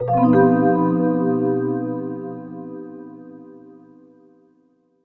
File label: Music Game Secret